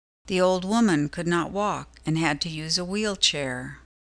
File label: wheelchair